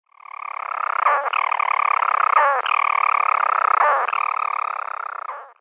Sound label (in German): Sturmschwalbe Hydrobates pelagicus; Vogelstimmen